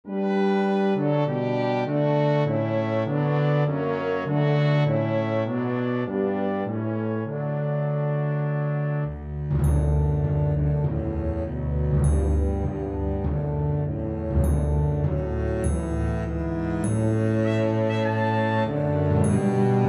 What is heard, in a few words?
Track with Melody; While Shepherds Watched